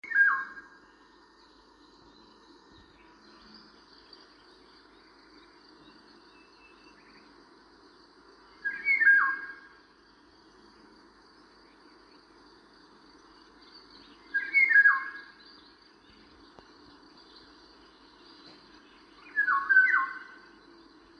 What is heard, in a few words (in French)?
Loriot d Europe